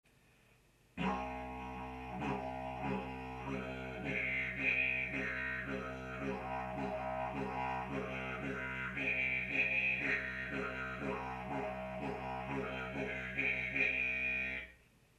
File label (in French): rythme, Rythmer le son avec sa gorge, didgeridoo